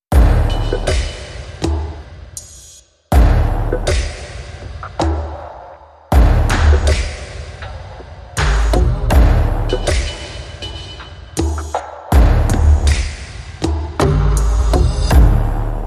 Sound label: Dynastic Drums